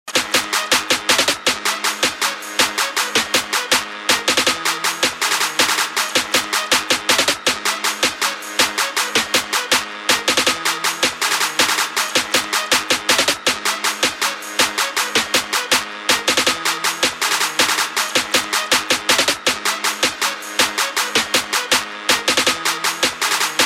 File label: Ringing hip-hop